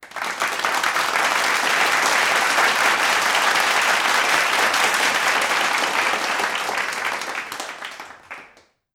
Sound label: Number; RODE KNOP; Workshop Kier; Een rode sprekende knop werd als rode draad gebruikt tijdens de workshop Kier, die vooraf ging aan de voorstelling.